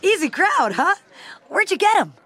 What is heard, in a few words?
crowd huh